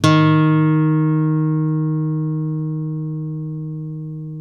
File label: acoustic D#2 L, guitar; East West; Steve Stevens Guitar Samples Collection; ACOUSTIC PCK